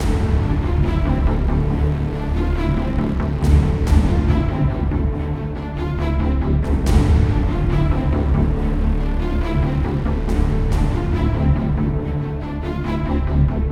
Intense Loop; Mass Effect

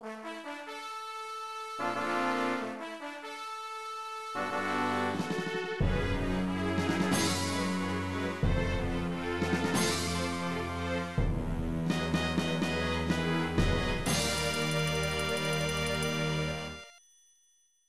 Fanfare; dungeons dragons shadow over mystara; cp system ii arcade

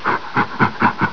Ape

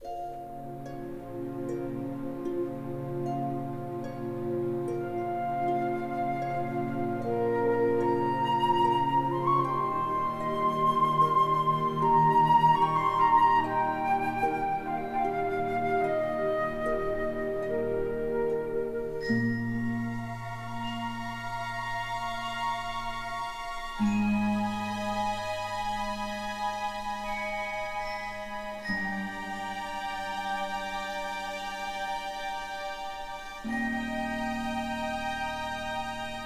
Menu Loop; Night at the Museum Battle of the Smithsonian; wii; xbox 360

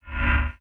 Messagebox In

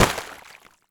gore head squish smash

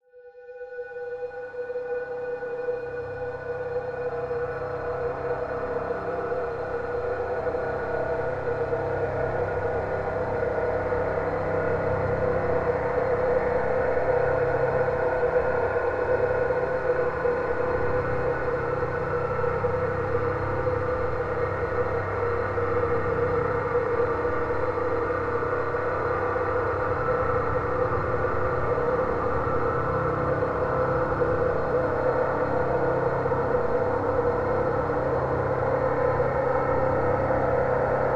Suspence Pad, Low Intensity

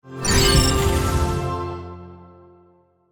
Test Passed; Yu-Gi-Oh! Master Duel

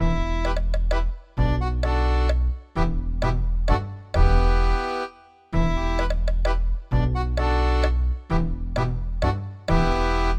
sea shanty; runescape

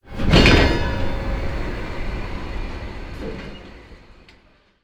Train Start

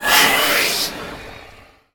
Raptor hurt

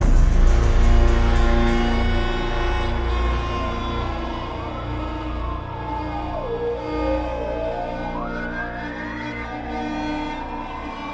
Ambient Insectoid Alien Horror

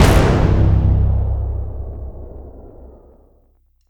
Fail

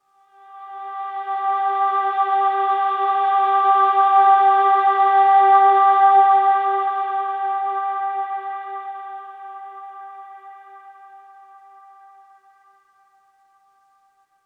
Oneshot Synth; zombie army 4 dead war; ps4 stadia switch windows xbox one

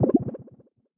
Se Ivt New Treasure

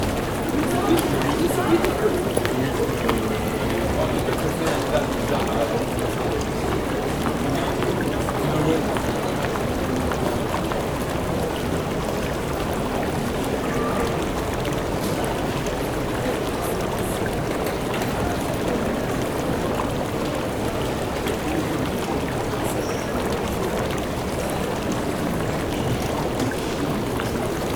Hall Outer Loop

